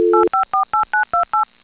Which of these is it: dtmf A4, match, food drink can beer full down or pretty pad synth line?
dtmf A4